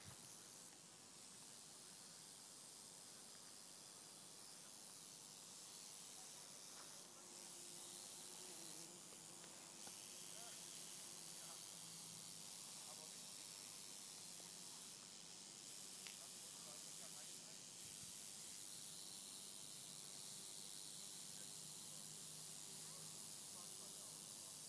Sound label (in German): tolle mischwiese mit grillenzirpen